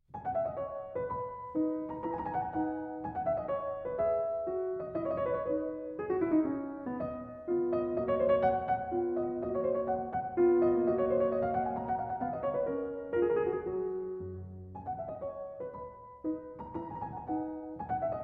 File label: Piano Sonata in B Minor, Menuet; Hob.XVI32